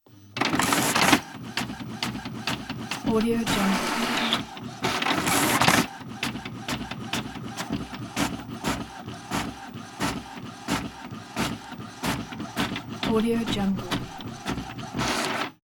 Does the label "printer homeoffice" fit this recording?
Yes